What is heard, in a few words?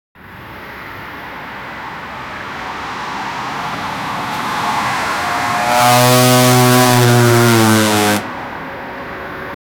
drifting sound made by a